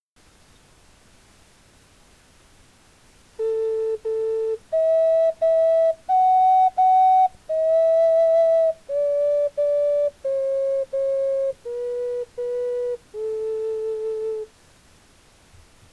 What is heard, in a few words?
twinkle